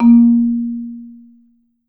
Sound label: MSOFT A#2, Marimba; Sampleheads - Dave Samuels Marimba & Vibes